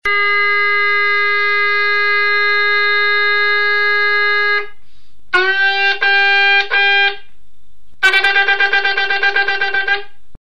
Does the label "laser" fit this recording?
No